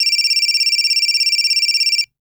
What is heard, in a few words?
Cell Phone Ring